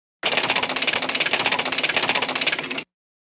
Train Stn Depart Sign, train station

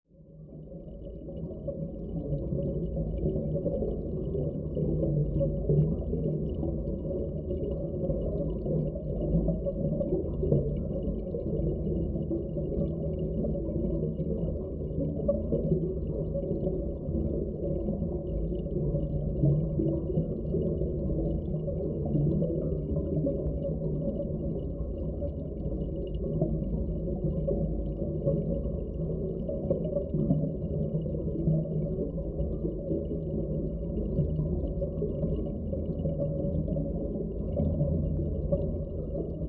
Underwater Amb Diver, ambience

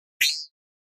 Button Tightly Coile

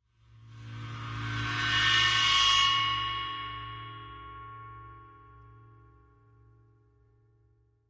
China Cymbal Bow, cymbal & gong; China 16 Cymbol Bowed 4

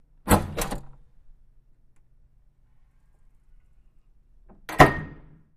Door Push Rail Wood

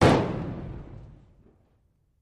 4 Oz Concussion Hit M

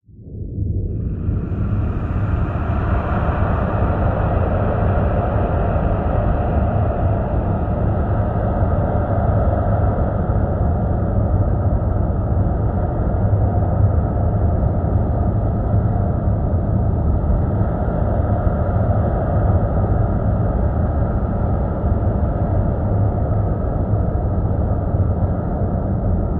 Nebula Dense Low Rumble

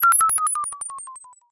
interface error, error tones; Multiple interface error tones at various pitches. Error, Interface Pitch, Tone Web Efx, Error

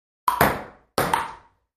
Ping Pong Serve Ball

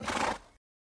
Horse Blow Snort

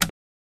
Mac Se Switch On Only, switches

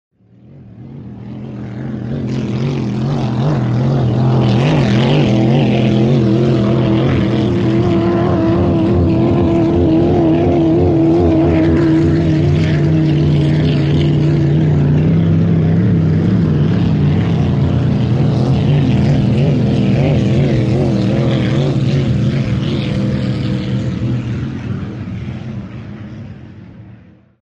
Power Boats By Fast C, off shore power boat

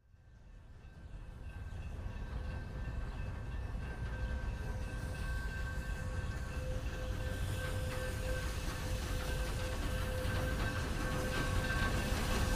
Steam Train By Bell

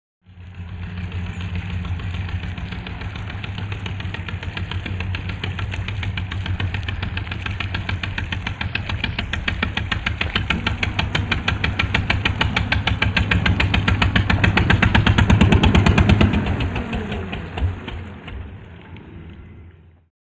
Boat Engine In Stop, powerboat

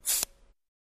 DC Arc Across Knife, arcs & sparks

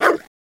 Dog Bark Nasty

